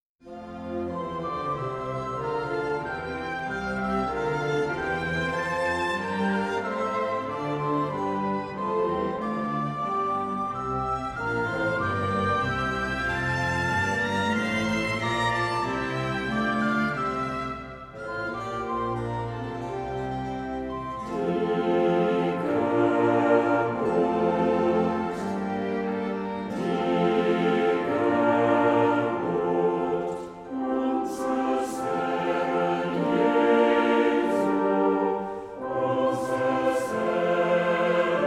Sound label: Choir, Brass Ensemble